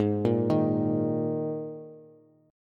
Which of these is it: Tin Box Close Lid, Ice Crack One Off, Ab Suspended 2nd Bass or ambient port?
Ab Suspended 2nd Bass